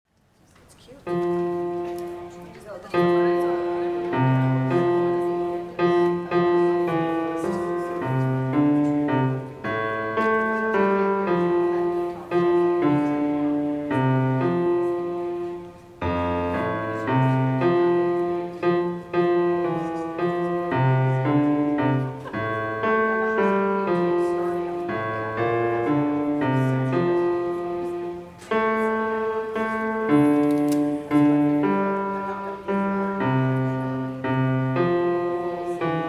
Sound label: Love Has Brought Us Here Together - bass